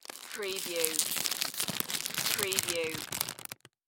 Bag Plastic Roll Open; Foley; sound effects bible

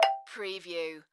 Multimedia Chime

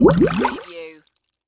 Tank Bubble Large

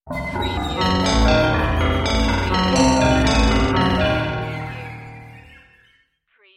Dark Production Stinger

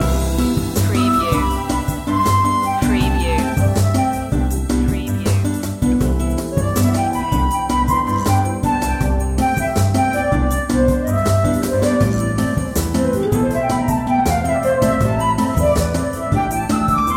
warm place loop

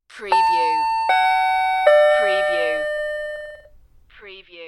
door bell ding-dong

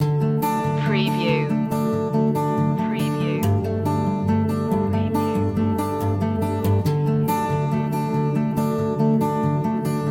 earthbound loop

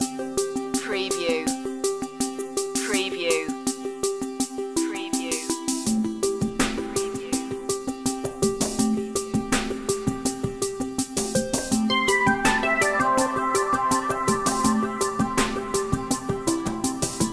motherboard loop

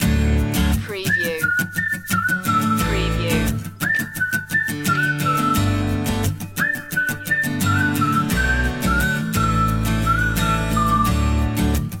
whistle acoustic loop

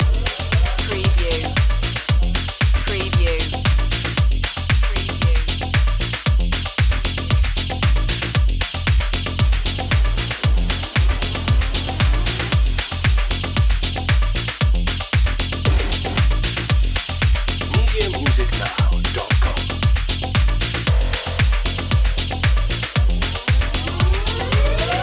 disco stepping loop

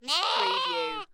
Animal Sheep Lamb

